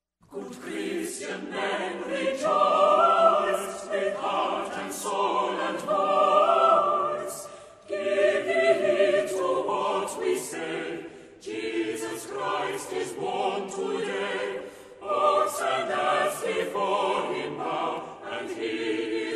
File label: Chamber Choir Good Christian Men Rejoice; Royal College of Music Chamber Choir